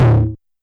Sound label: Tom Ana Dist, YAMAHA RM1X